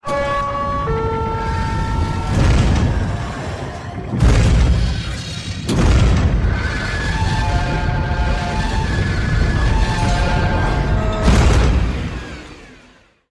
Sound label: Blast doors down